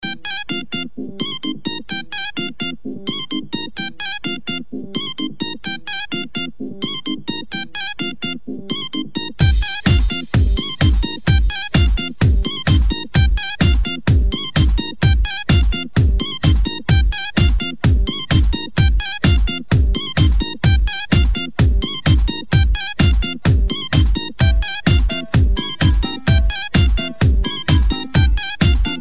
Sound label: Romanian Nokia